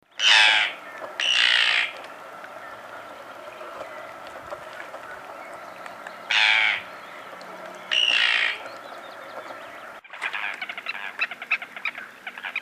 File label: Sterna paradisaea